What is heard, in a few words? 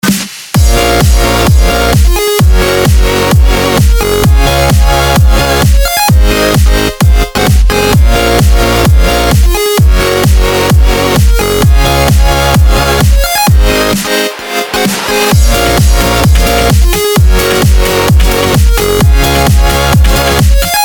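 jingle; Otbivka 2014; отбивки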